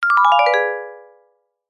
cartoon descend vibraphone happy fun mysterious musical